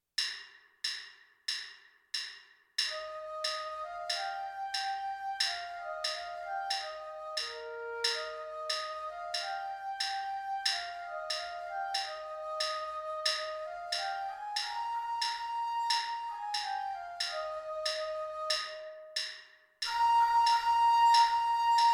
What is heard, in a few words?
alouette flute